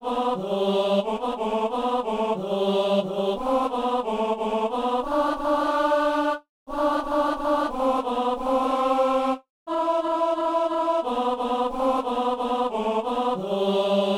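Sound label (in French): ténor; les saints et les saintes de dieu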